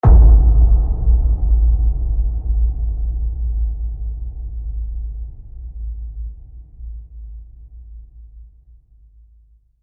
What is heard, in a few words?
boom collision